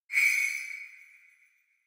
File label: Kagura Suzu